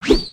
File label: Char Delete Whip